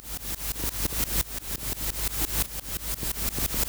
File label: Rythmic Noise 130BPM